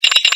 Coin Pay

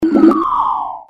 sink doppler

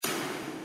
shoot low

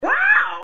Wow Scream

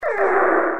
ouuuh

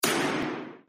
Shoot

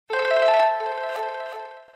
OK Win Low

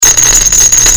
chips

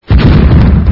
Burst

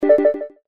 Luck Sound

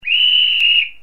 Whistle

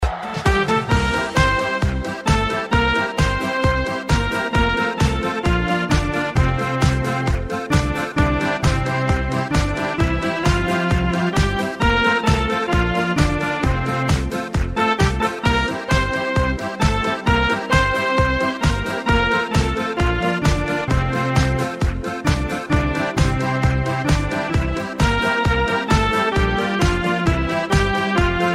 Beer Music

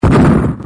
burst

